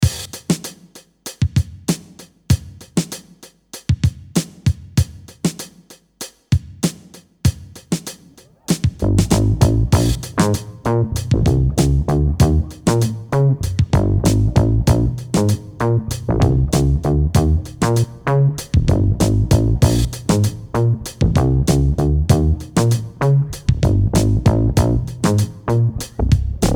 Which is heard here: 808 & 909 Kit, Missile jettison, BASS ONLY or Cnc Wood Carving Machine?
BASS ONLY